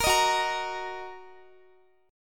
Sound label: Gb 6th, Guitar; Listen to Gb6 strummed